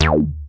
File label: resy bass, Synth